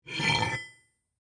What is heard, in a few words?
Metal Weight Up, Foley, Metal, Weight, Pick, Up, From, Metal, Drag, Slide; BlastWave